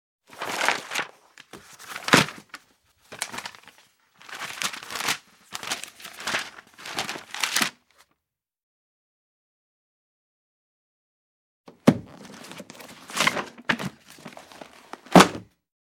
listanie stranic tolstoj knigi

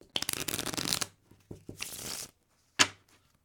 prolistivanie igralnih kart

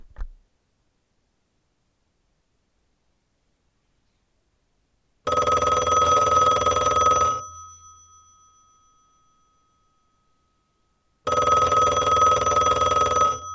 zapis starogo telefona kotorij zvonil

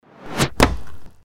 zvuk lezviya na gilotine

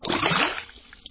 voda odinochnii korotkii vsplesk

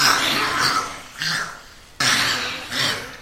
deti imitiruyut richanie lva

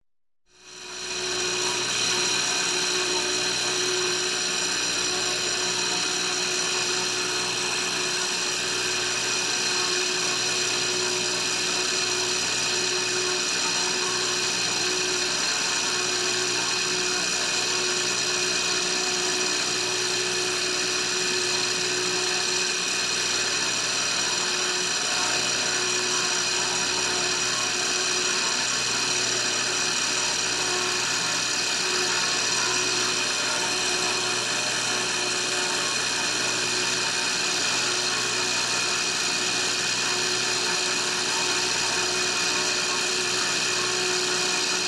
rabotayushij elektricheskij nasos

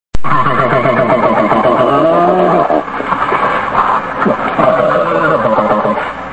medved boretsya do poslednego kogda v nego strelyayut

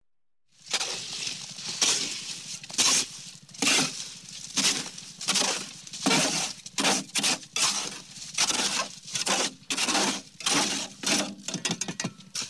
zvuk lopati zasipanie peska v betonomeshalku

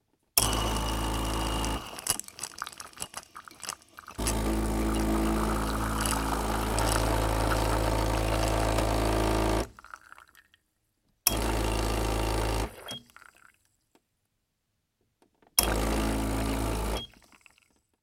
zvuk kofemashini prigotovlenie espresso

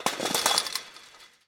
korotkij zvuk silnogo drebezzhaniya

